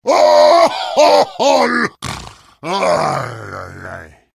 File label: laughter big; vo; heavy